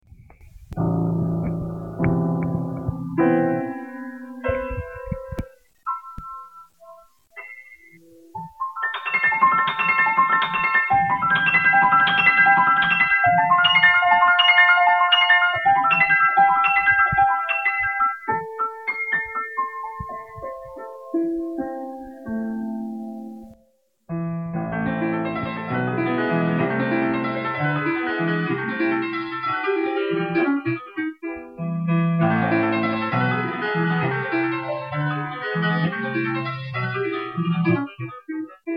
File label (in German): Klavier